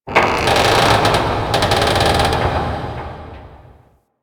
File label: Base Large Metal Creak B; Warframe; Ambience; Star Base Intro